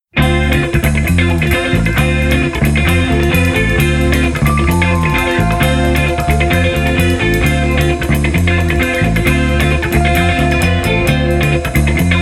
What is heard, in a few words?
FUNK ROLL